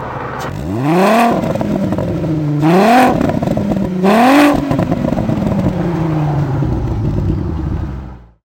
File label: mk4 jetta vr6 standstill